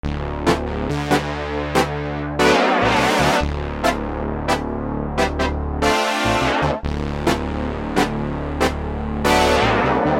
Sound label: Thick Brass, V-Synth